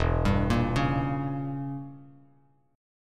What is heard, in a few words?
E Diminished 7th E, Bass Standard